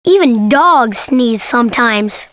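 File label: sneeze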